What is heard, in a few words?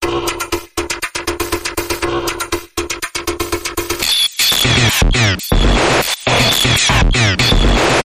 Screech Electronic Bumper Reversed